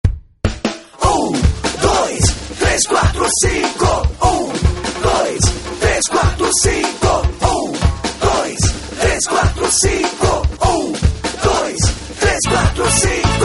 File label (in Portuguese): Jingle politico